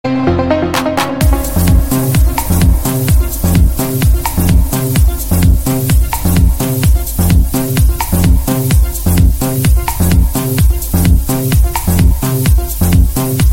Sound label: arabic remix ringtone